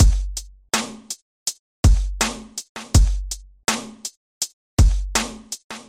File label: beat